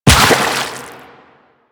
Run Water, Footsteps; Warframe; Quests; The New War; Kahl

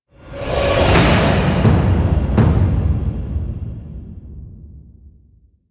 Player Death, Music; Warframe; PVP

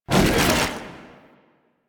Dodge; Warframe; Broken Frame

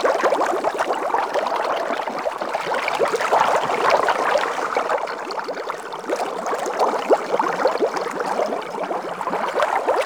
Idle Bubble Loop; Warframe; Sharkwing; Vehicles

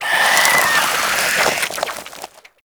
Infested Face Hugger Latch Vocals; Warframe

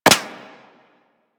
Bullet Impact Default; Warframe; Weapons

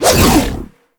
Hammer Shield Throw Whoosh A; Crp Hammer Shield

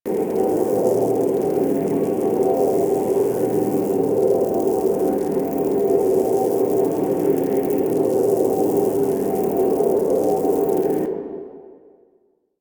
Maze Lock Loop, Medusa Puzzle; Duviri

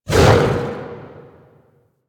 Axe Weapon Swing; Warframe; Tenno; Wolf Frame

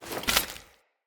Sphere Polearm Finisher; Weapons; Player; Finishers

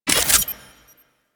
Map Marker Deploy; Open World

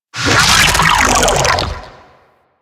Sentient Vocal Death; Warframe; Sentients; Dolicholyst

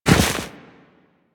Run Deep Snow, Footsteps; Warframe; Operator

